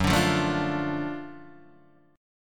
Gb Minor Major 7th Sharp 5th, Guitar, Open D; down; chord shape

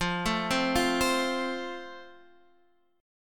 F Major, guitar chord

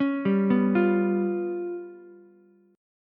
C sharp 7th Flat 5th C sharp down Ukulele; C#7b5; arpeggiated; JGuitar

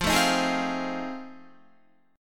F 7th Sharp 9th, Guitar; F7#9 strummed

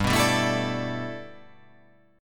G 6th Add 9th

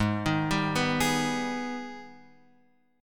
Ab Minor Major 7th, down, Guitar, Open F; AbmM7; arpeggiated

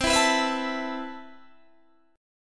C Major 13th C, Guitar Open D

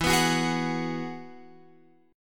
E Major Add 9th E, Guitar, Open F; strummed; x,11,11,13,11,13

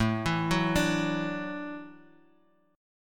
A Suspended 6th A

